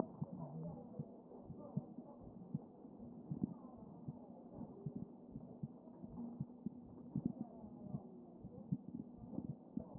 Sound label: CHEST pos4 D MODE